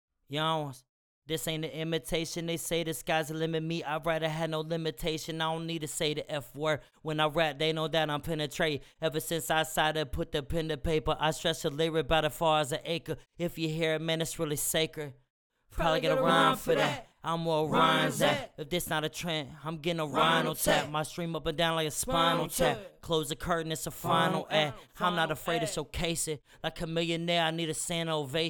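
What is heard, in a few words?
hip hop, 140, E minor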